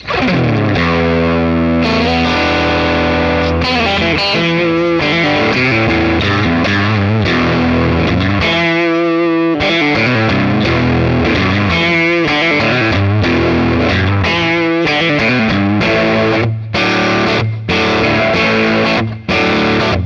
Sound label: Custom 57 Cranked Up 1x12 Classic Ceramic; Scuffham Amps S-Gear